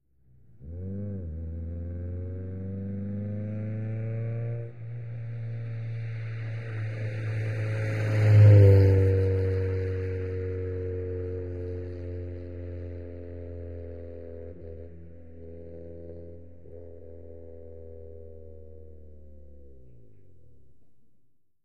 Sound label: Integra, quick approach, medium by, long decelerate away, Acura Integra